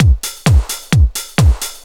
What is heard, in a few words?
drum 130 dance, drumloops; Techno Trance Essentials